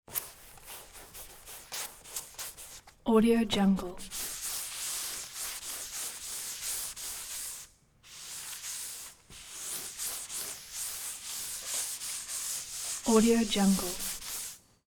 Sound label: chalkboard